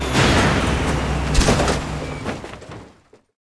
dead, monster

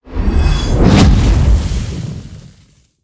boss dragon

